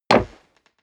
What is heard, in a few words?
step leather shoes wood creak jump; schritte